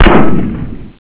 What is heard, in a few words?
helmet